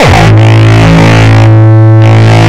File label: Longkick, kicks